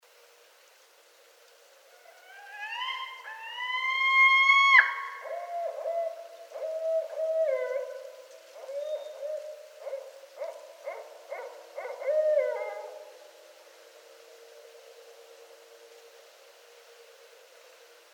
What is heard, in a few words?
Barred Owl